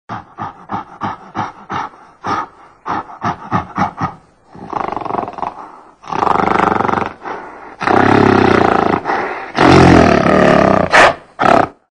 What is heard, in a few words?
silverback growl